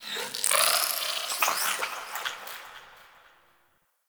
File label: Lair Exterior Creature; Ambience; Misc Creatures; Sentient; Warframe